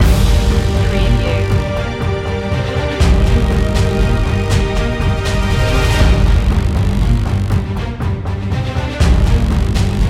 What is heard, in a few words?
loop